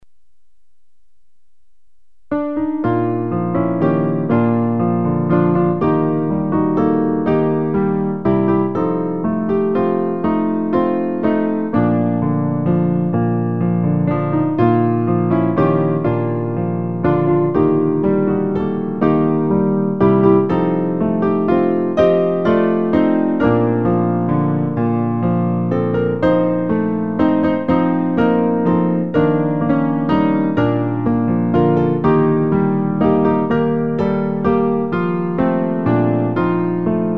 piano; recueil Louez Dieu

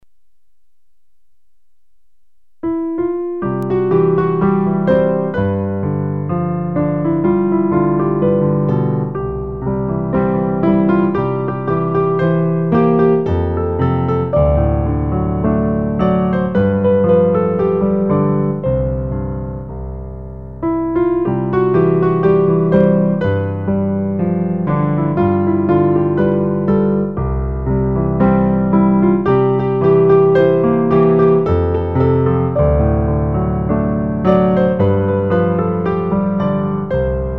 quel bonheur de marcher avec lui piano

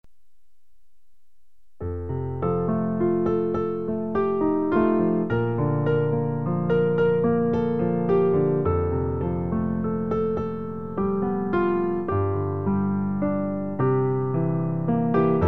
piano; Jésus, nous T’adorons; Pour accompagner le recueil Louez Dieu.

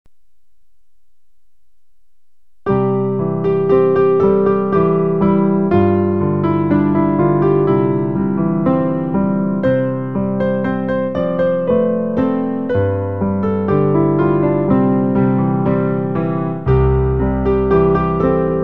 piano

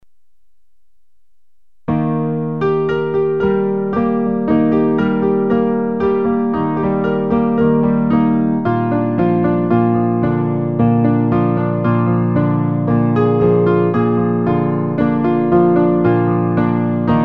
piano

